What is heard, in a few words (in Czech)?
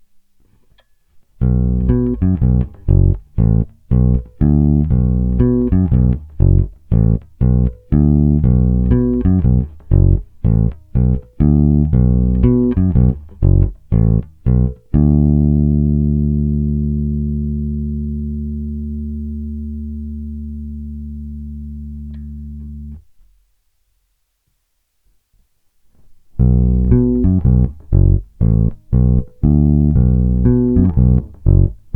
Thomastik Fender TF snímač, Struny Thomastik-Infeld jazz flats, tónová clona otevřená - cca 50% - tónová clona zavřená; Téma: Jay Turser Precision bass JTB-400C-BK-A-U